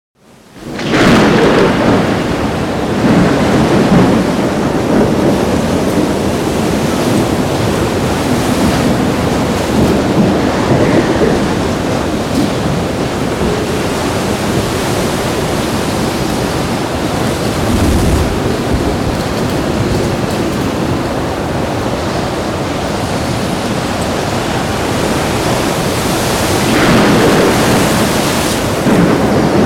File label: Ocean Thunderstorm